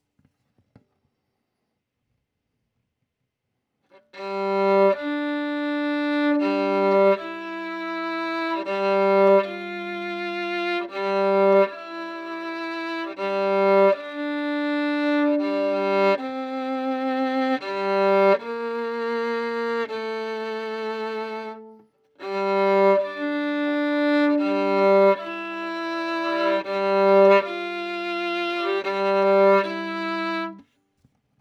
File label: Violin